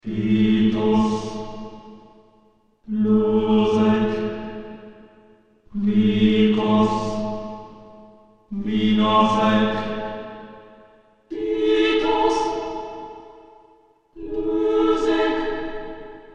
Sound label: Choir Chant; Soundtrack; Final Fantasy 8